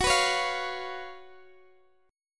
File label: Gb Major 7th Suspended 4th Gb, guitar